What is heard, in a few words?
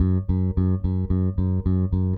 Eighth Notes F#, Bass; Construction Kit; Bass X